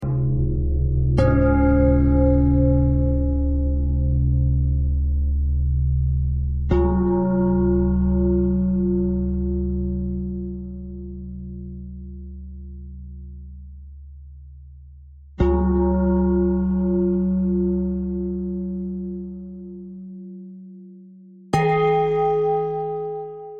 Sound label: bell bell thread simple, bells